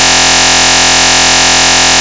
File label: white periodic; SignalLab2